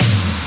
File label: melbourne bounce snare big; Hip Hop; STYLE KITS; D3ron Melbourne Bounce Kit